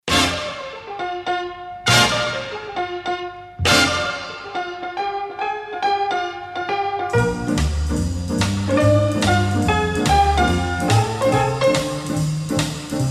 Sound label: honky tonky blues